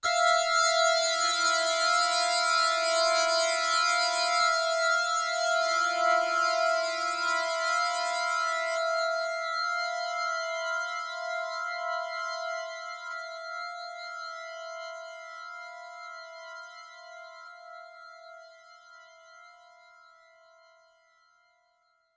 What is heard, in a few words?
stinger